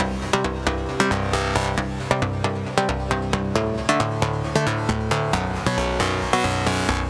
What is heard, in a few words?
Dream Arp, секвенсор